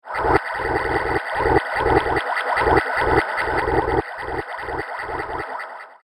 Space Federation Planet Scanner, science fiction sound effect; star wars / trek style games